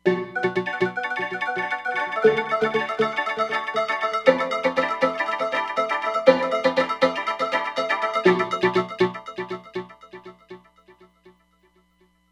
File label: FM's Attack